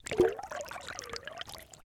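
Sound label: Water Impact, Submerge; InMotionAudio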